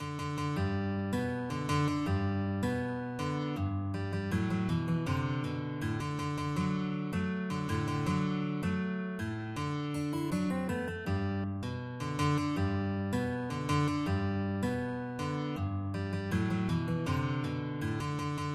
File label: 2 GUIT